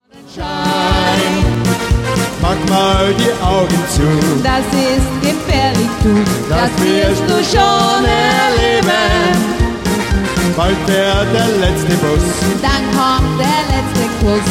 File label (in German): Duett